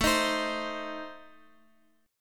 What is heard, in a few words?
A Diminished, Guitar, Open F, Adim chord; strummed; x,x,x,4,6,7; JGuitar